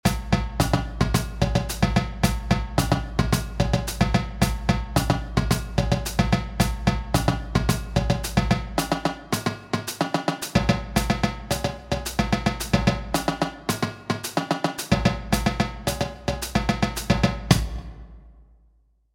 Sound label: ninja disco all Parts